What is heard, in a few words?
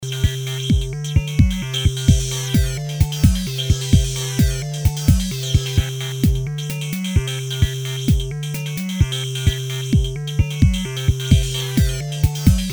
salsa beat